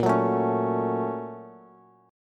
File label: A sharp Minor Major 7th Sharp 5th, Bass